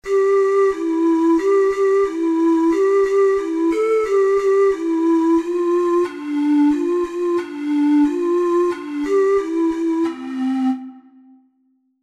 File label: rain rain, ocarina four hole